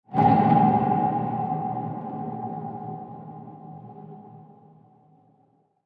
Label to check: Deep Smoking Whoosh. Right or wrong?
Right